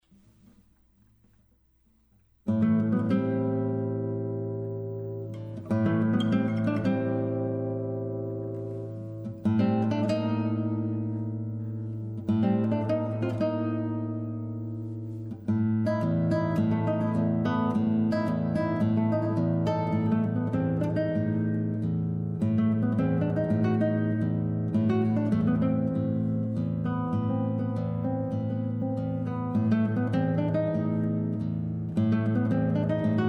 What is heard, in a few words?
Murga, guitar